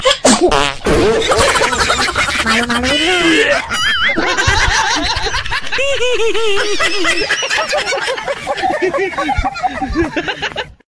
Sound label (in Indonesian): ketawa bersin